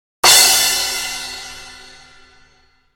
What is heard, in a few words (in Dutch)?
drumonderdelen